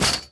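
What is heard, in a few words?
kick off chain fence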